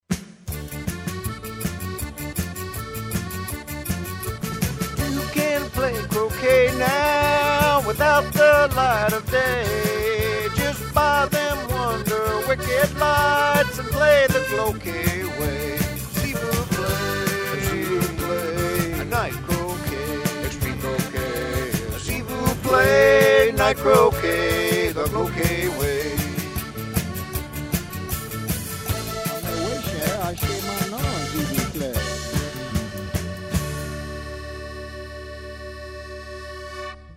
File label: Zydeco Jingle; fun songs